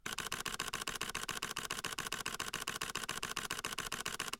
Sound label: Sony a7R IV Burst